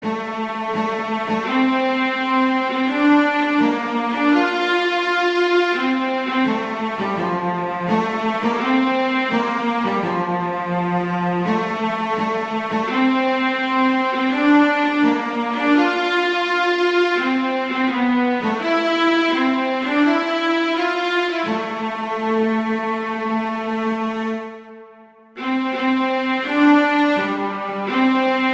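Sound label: O Holy Night, Viola; Christmas Beginners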